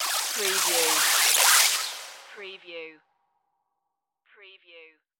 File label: alien bug flight